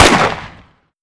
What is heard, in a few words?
smg classic shoot; tf2classic